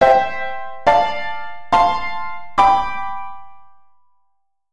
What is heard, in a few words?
imperfect cadence I-ii-IV-V